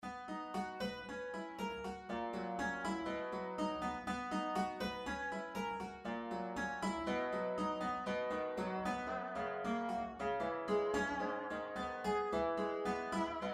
clavichord; Heller O47 N1

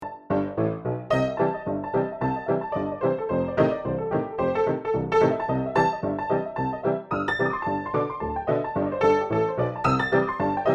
far west piano modern

